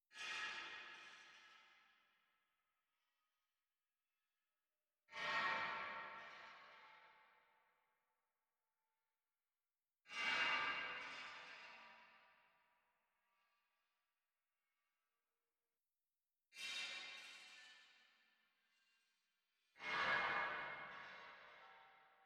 ambient soundscape underground

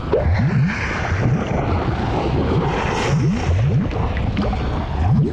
gold idle, anomalies; Arrival

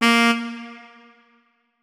notes, saxophone; Hideout Furniture

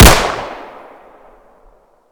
ppsh41 shoot, weapons; Boomsticks and Sharpsticks; ppsh blindside

